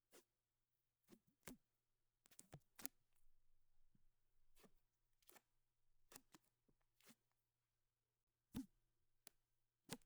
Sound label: Electric Tape; Soundmined